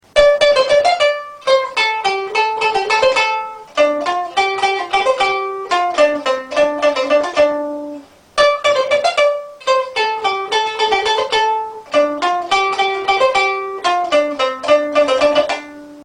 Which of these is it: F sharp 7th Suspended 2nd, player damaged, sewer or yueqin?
yueqin